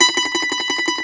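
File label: Mandolin tremolo, Plucked Strings; E-MU Producer Series Vol. 7 – Old World Instruments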